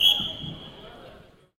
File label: Futsal Whistle